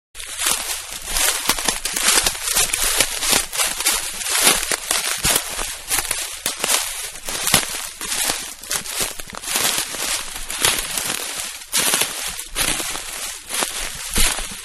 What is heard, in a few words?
Walking On Sticks Twigs In Wood; Footsteps (Sound Effects:Human); Walking-On Sticks And Twigs In Woods; Manhattan Production Music